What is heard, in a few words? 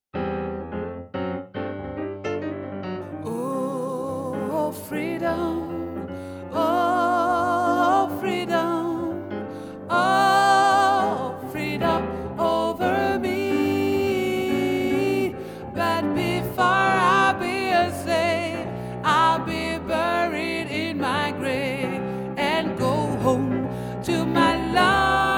Oh Freedom Sopranos, Gospel